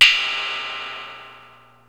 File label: TR, drums perc, Roland